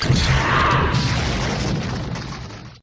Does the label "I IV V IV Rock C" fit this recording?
No